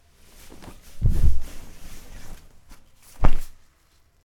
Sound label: Laundry